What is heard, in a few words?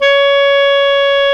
clarinet, Clarinets; Clar Jazz; Roland L-CDX-03 Disk 1